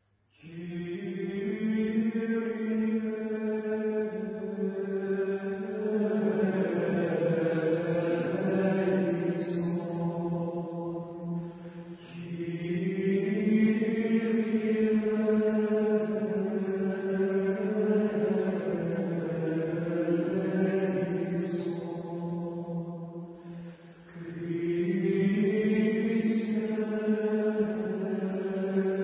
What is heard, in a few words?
Kyrie XVIII B (pro defunctis) Gregorian Chants; 古典（格列高利）